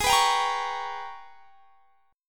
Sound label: Ab Minor Major 11th down; strummed; AbmM11